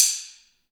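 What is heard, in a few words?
drum sticks, WOOD; Spectrasonics; Heart of Africa 1; AF.WOOD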